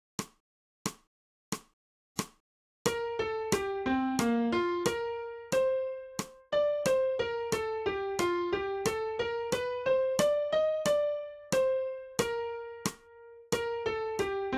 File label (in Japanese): 新フレットレス